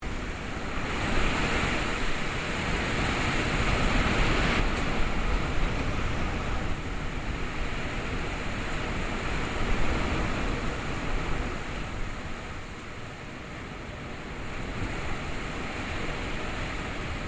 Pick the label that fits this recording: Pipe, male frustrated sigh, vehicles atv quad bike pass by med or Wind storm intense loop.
Wind storm intense loop